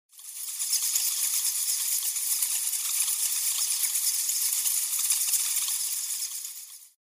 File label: bat